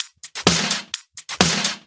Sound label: Electro Loop 128 BPM; Vengeance Samples